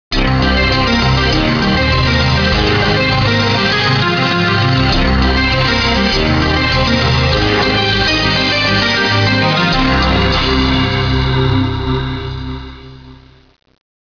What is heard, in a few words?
synth